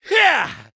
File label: Rain Of Arrows